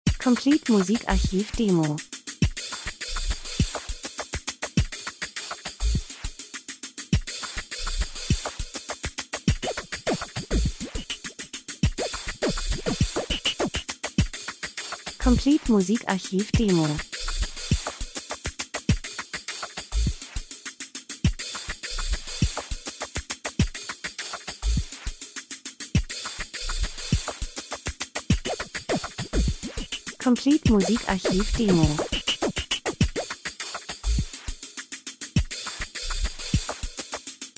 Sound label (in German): drums, rhythmus; Elektro Pop Rhythmus puristisch Lifestyle Positive Stimmung Urban Club Abend Feiern R & B Industrie Dokumentation